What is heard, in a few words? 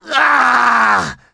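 death scream, male; survivor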